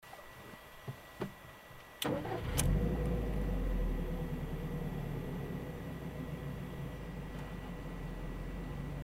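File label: engine START INSIDE; GDB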